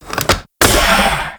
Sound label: Key Remove; Warframe; Captain Vor